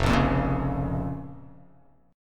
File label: F Suspended 4th Sharp 5th F; strummed; 1,1,3,3,1,x,x,x; JGuitar